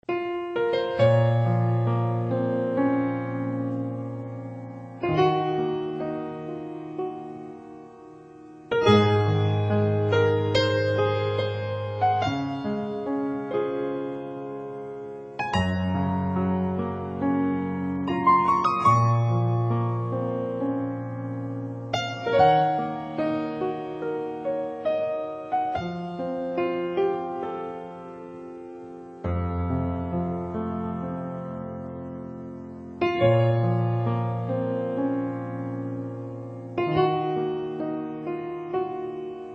轻柔的背景音乐

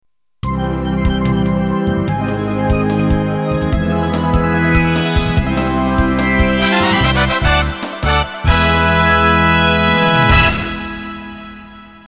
片头音乐

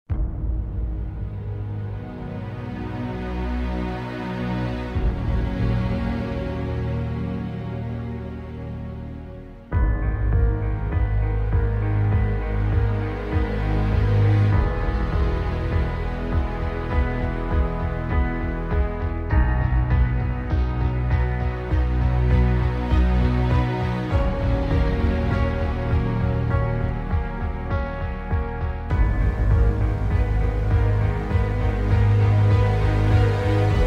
专题片背景音乐

